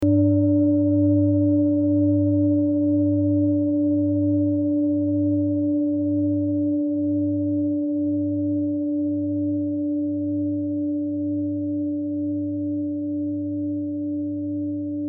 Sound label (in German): klangschale set; Klangschale Nr.2; Gewicht = 2440g; Durchmesser = 29,2cm; Höhe = 13,1cm